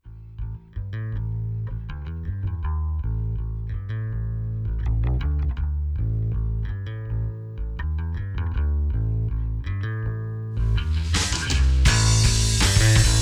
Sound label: Bassend Static